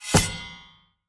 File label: sword grab; player